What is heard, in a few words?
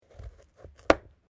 PUMPKIN CUT